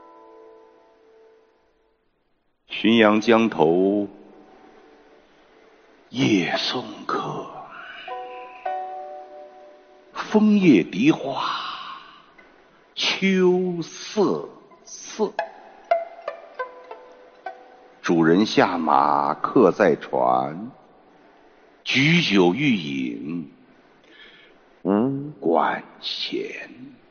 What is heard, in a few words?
beginning of pipa ballad